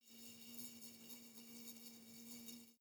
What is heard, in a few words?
hornet hum glass constant soft